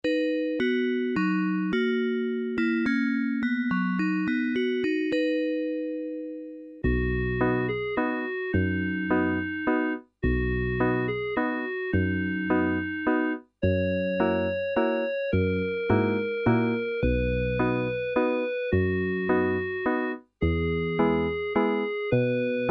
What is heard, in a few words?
yamaha psr 12 silent night stille nacht